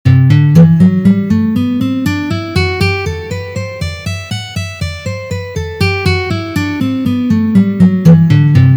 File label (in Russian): Gamma Sol mazhor Tri noty pozitsiya